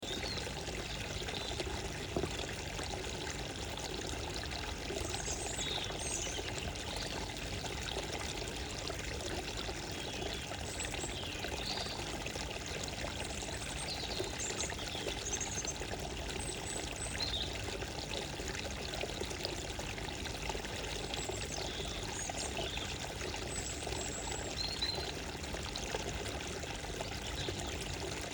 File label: calming morning water sounds and bird sounds